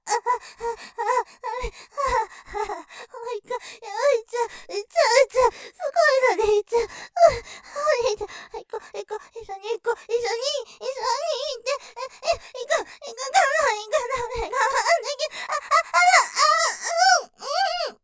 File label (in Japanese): 徐々に加速する絶頂, ASMR; ステレオ; ループで楽しむ！シェフの気まぐれ抜き抜きコース